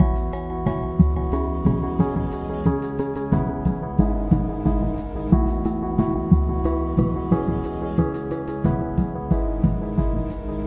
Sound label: 090 ambient strings n melody; SAMPLED MUSIC LOOPS